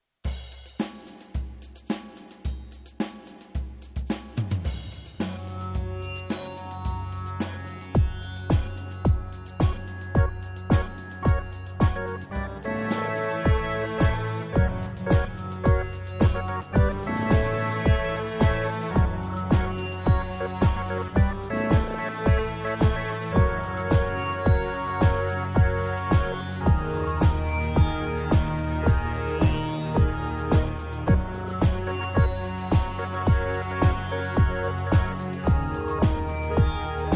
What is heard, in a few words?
Instrumental